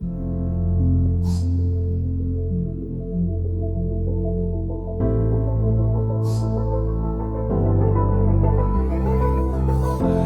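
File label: karaoke instrumental backing track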